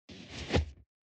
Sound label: Cloth movment